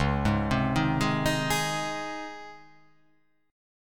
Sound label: C sharp Major 7th Suspended 2nd, C sharp